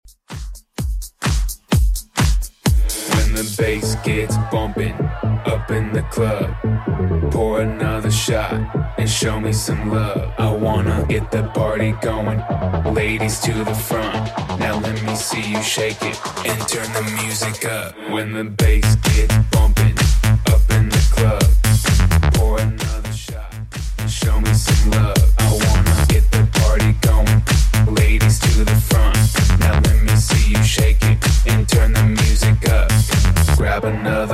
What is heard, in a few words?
Dance Electronic, 128 bpm; Extend